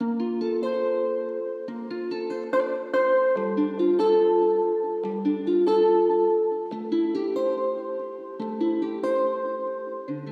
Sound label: Nostalgic Melody Loop - 143 BPM F Maj Guitar; Melodies; Loop Stems; Cymatics; Oracle Sample Pack